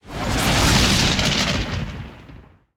Corrupted Idle Sound

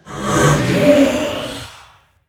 Wailing Soul Enter Play

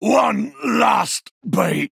Male Dwarf Death

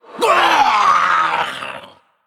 Male Undead Death

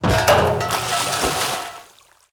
Cauldron Underlay Death

